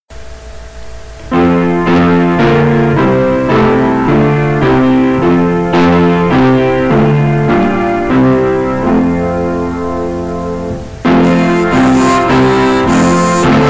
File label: Music Breaking bread